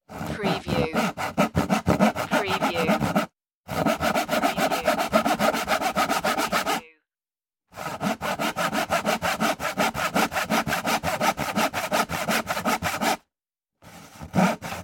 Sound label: sawing wood